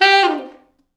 T Sax falls, Horns Sax; East West; Quantum Leap Horns Sax

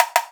SN, EMU Orbit-3 Drum Machines; 300 Drum Machines

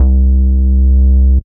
C Bass

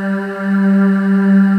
BREATH G3

